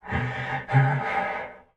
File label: Player UI, sfx; soma; Five Nights At Akers